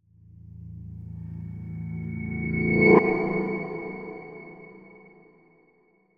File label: Upright Piano Transition; MatiasMacSD; PIANO ZONE ATMOSPHERIC ELEMENTS